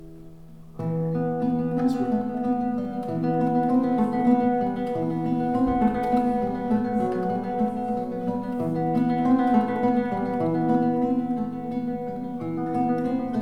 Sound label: guitar, Church; Canwich Church